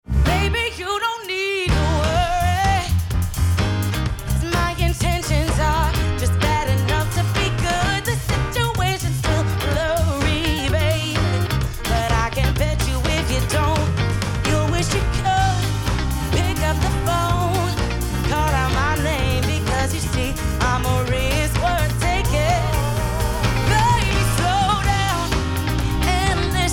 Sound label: Sample RnB Mixed